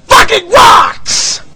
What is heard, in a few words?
rocks